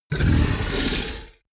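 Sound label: fishman breath grunt, creatures; kreaturen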